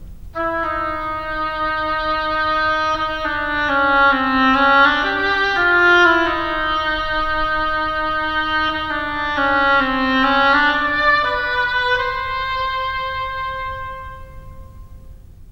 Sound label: Duck Oboe